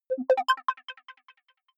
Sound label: button echo distorted high arpeggio; maschinen; signale; soundpool